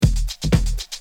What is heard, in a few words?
drum loop